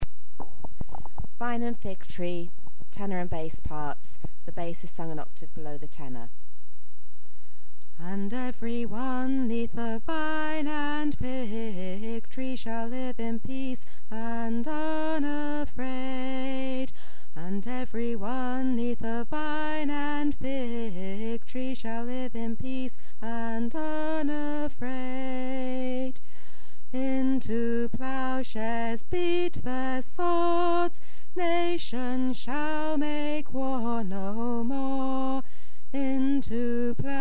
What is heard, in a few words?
tenor bass